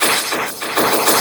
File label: 3-D MACHINE; Spectrasonic Distorted Reality 1